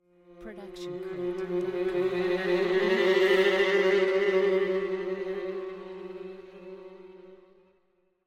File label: string swell tremolo; soundscrate